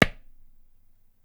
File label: tabla; Dirt-Samples